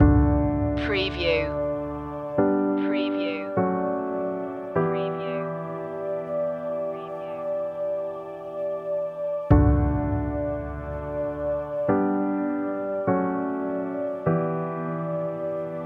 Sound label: will it rain loop